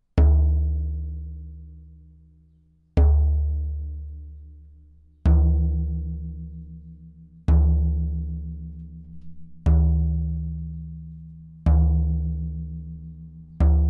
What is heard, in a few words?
before tuning